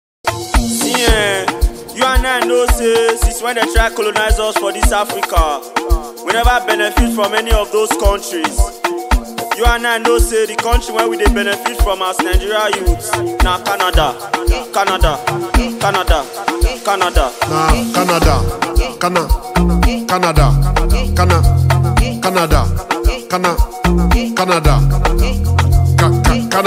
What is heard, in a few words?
instrumental